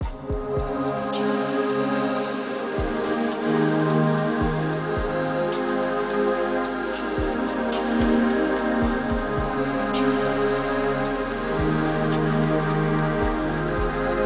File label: Ambient; Ambient Music Pack Vol. 6